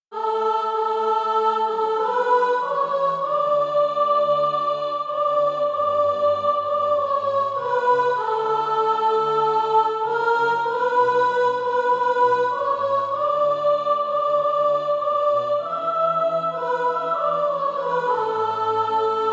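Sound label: sop, Practise Music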